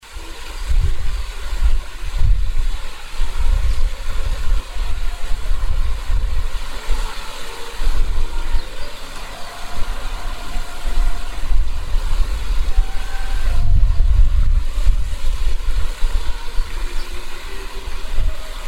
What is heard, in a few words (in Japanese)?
kawa; douwamura; 花巻市